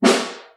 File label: SN1 Far, Snare